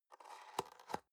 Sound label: box small close soft